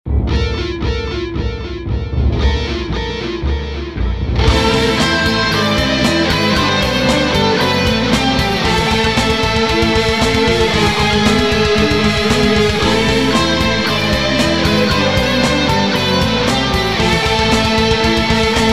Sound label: metal; final boss; 1992 sonic the hedgehog2